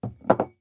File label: Household Dishes Move Ceramic On Wood